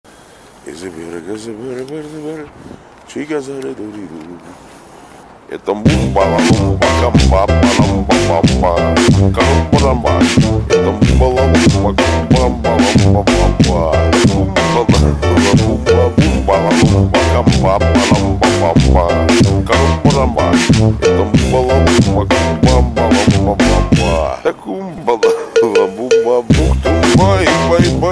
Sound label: reggaeton